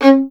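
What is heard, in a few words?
STR violin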